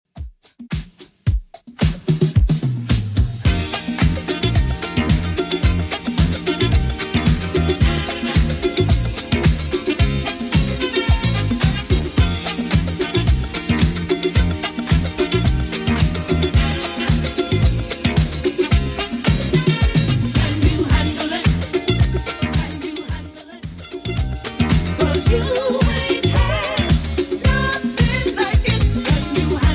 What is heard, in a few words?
80s Redrum 110 bpm CLEAN